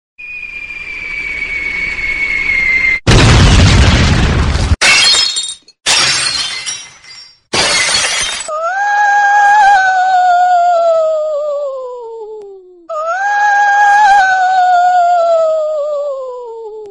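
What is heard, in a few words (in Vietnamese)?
tiếng đồ vỡ và ma kêu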